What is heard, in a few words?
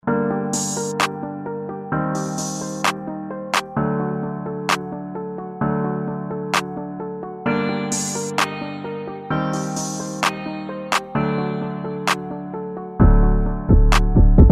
trap beat 130bpm